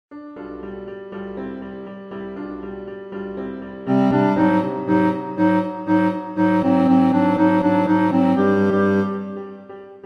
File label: 2nd Chorus Entrance Tutti; Act I Finale; First Section; grandduke